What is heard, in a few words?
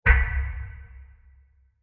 Diffuse Deep Impact; Blasts; Impacts; Sound Effects:Impacts; CraftedSignals